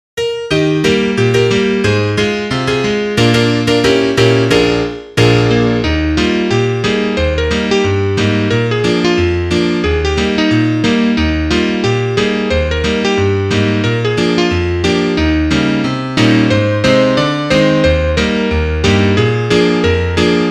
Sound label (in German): Klavier Solo, trompete; Fix & Foxy